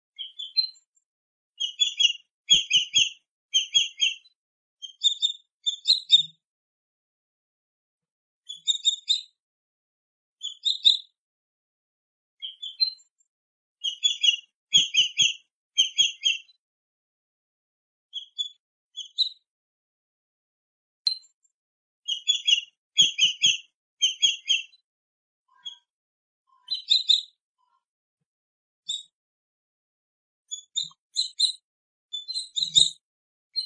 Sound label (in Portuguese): Canto do Gaviao tesoura